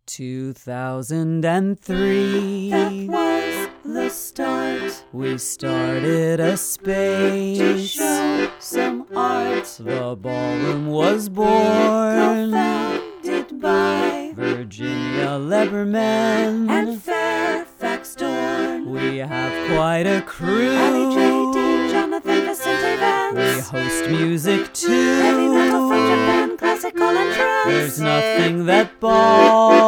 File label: Jingle